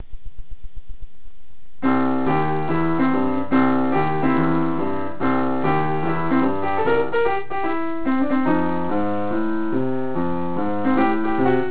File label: Piano Playin' Chocolate Eater's Blues